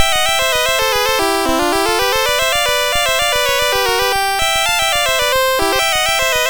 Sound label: MOS6581R4, GAMES